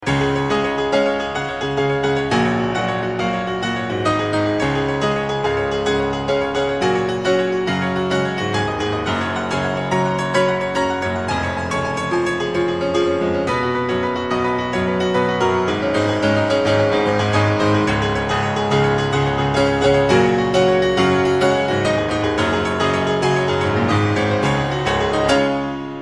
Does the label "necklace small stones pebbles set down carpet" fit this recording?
No